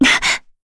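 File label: Jump, Korean; voices; heroes; Yuria; kings raid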